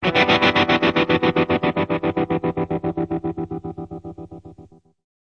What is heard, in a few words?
forever tremelo chord, Guitars